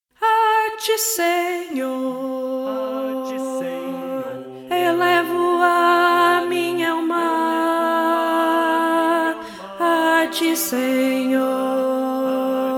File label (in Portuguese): a ti senhor soprano 1